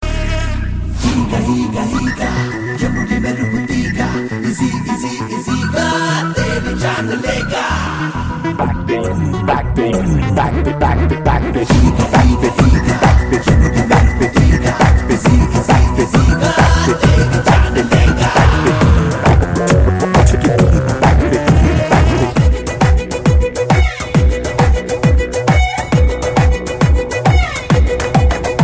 eega remix ringtone

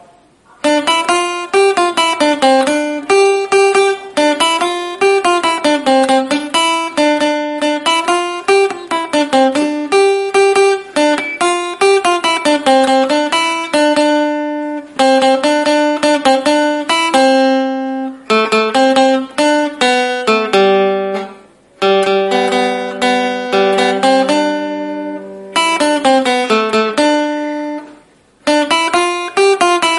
Instrumental

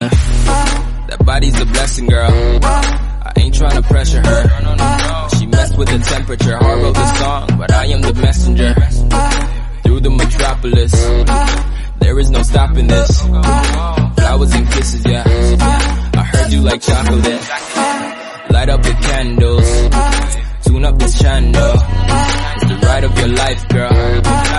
Movement bgm

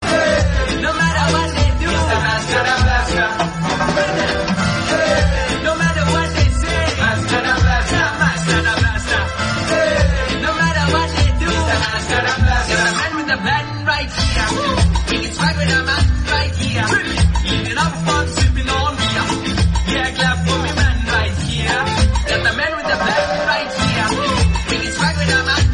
Tamil Movie BGM

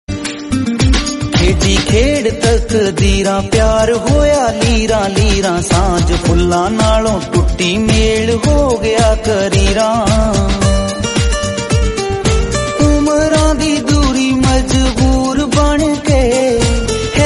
Sad Ring Tone

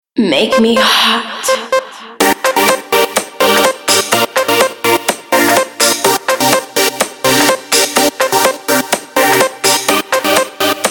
house

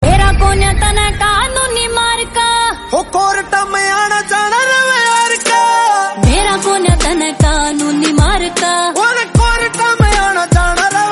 Haryanvi Song

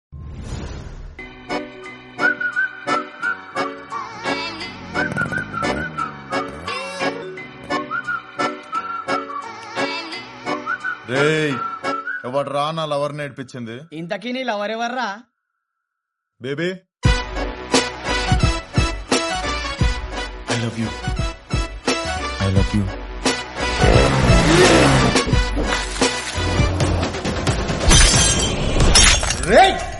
ad Bgm; Pagal Movie

